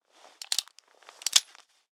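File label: weapon pistol 32 jam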